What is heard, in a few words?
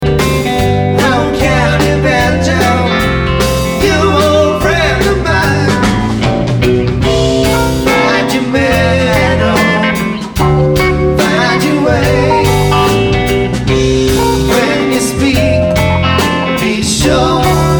Banjo